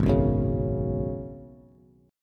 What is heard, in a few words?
Ab Minor 11th, Ab, Bass, Bass Standard; Abm11 strummed; JGuitar